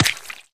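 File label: free spins hit heavy forest add; wild worlds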